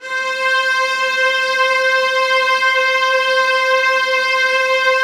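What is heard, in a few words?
violas sustain c5; Sonatina Symphonic Orchestra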